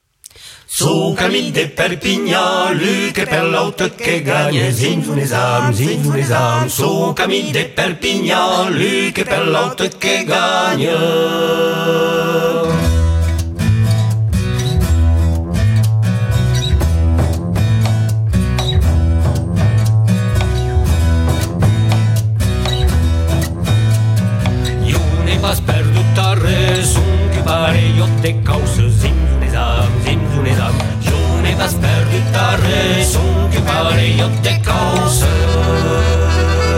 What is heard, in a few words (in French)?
rondeau en chaine; suu camin de perpinhan